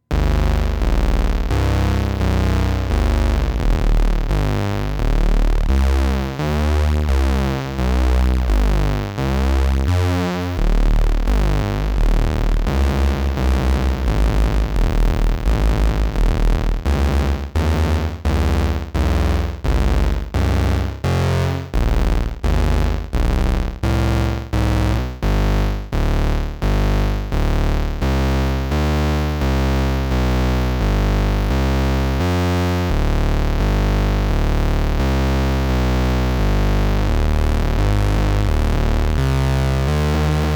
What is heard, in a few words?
Dubreq Gen R 8 Osc Sync